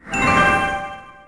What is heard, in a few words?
ui mission finish